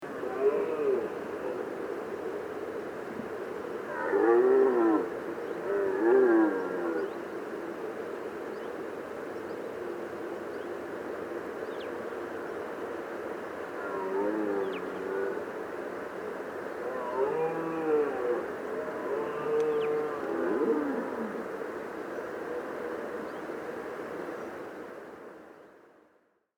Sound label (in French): Brame